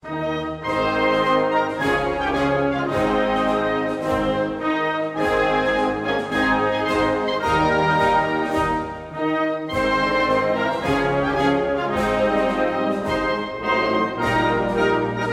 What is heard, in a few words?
Darah Rakyat instrumental